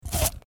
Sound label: eat ice candy